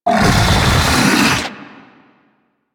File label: Rock Elemental Cast; Warframe; Brawler